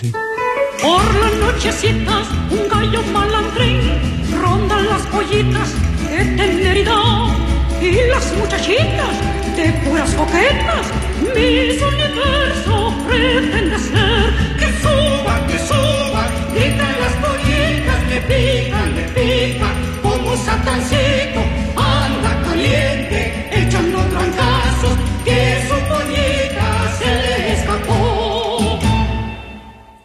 Chanson latino

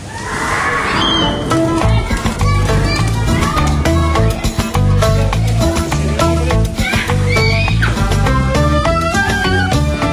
Chanson gitane

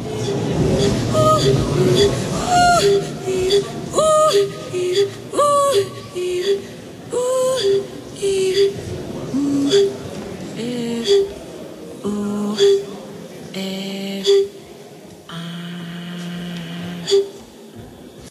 Chant

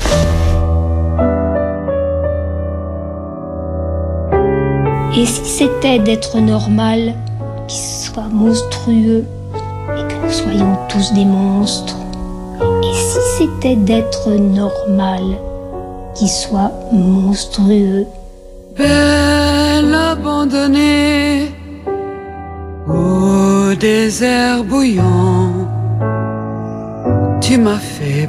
Chanson

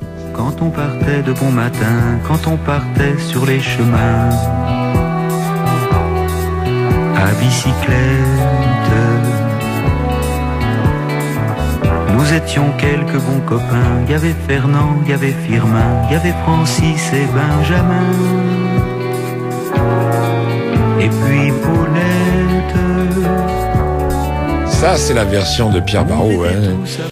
Chanson

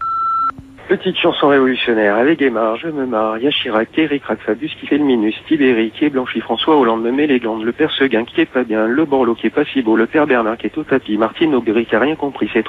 Chanson politique, repondeur

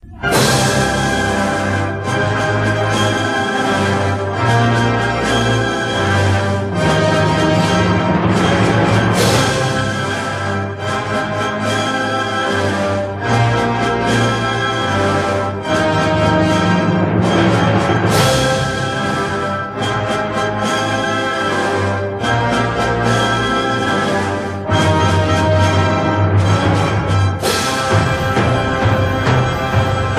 Musique classique

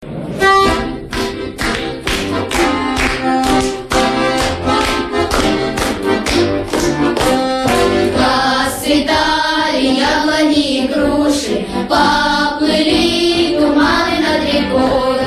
Chant russe

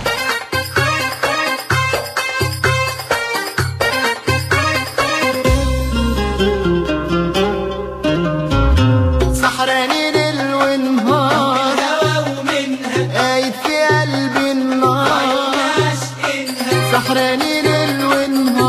Chanson tunisienne

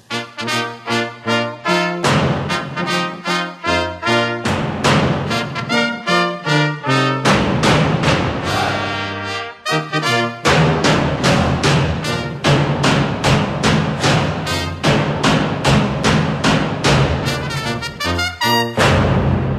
Musique Marseillaise

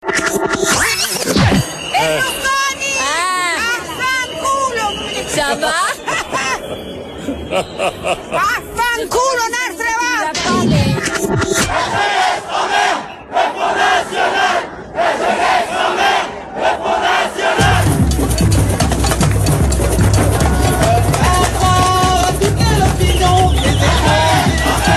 Manifs ambiance

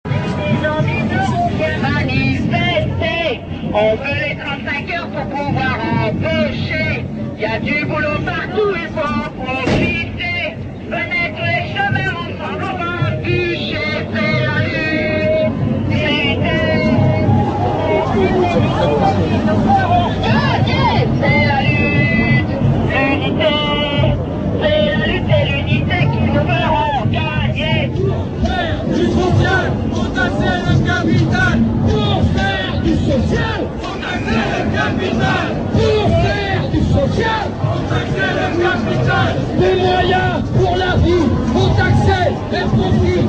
Manif anti precarite ambiance

